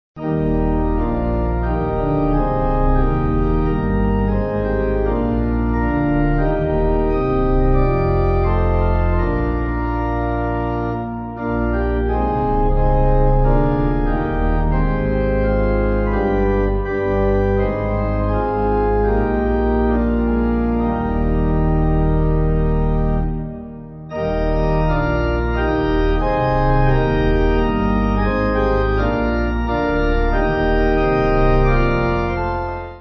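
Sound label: Pipe, Pipe Organ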